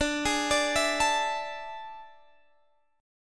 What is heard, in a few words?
A Major 7th Suspended 4th D